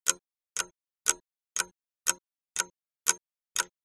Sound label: clock ticking slow; 2сек; 44100; kazan